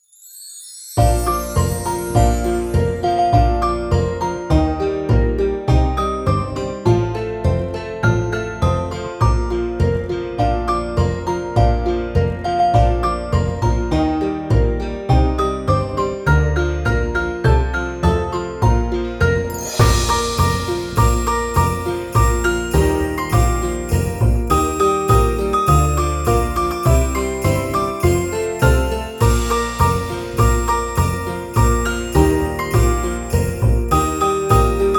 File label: jingle bells